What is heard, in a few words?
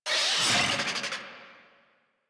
Infested Charger Attack, Enemies; Warframe